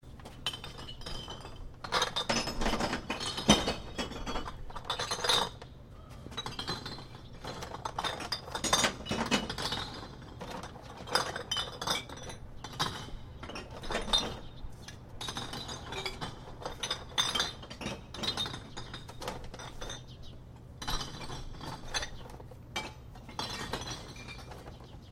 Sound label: glass bottle recycling